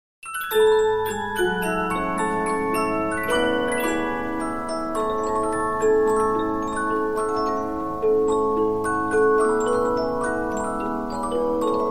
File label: Polyphon, musicbox 15inch